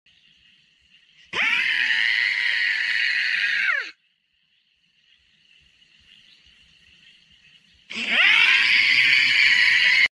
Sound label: tasmanian devil; شیطان تاسمانی